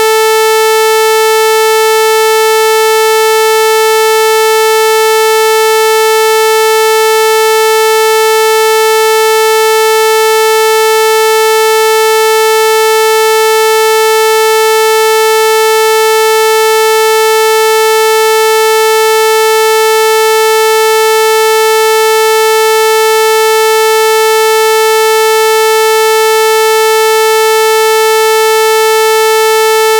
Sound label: A 440Hz sawtooth